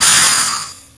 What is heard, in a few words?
AIR RELEASE MOLDING 8Bit